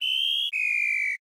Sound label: Yawn Voice; Winky